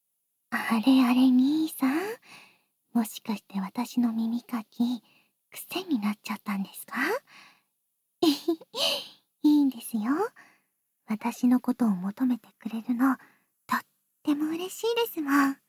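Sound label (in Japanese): 吐息耳かき（バイノーラル編集 効果音あり小さめ）; ミミカノ vol4 寧々; バイノーラル美少女耳かき添い寝シリーズ; スタジオ収録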